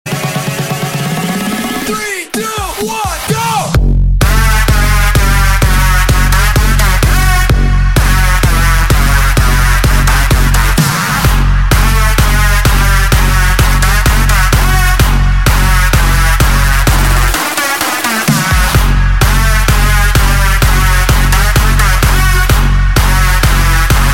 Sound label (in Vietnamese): Nhạc chuông edm tiktok